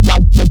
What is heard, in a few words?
Growl Bass; neuro; BIG5AUDIO